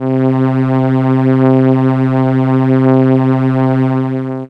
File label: Cello C3, ARP Solina; musicradar-synth-samples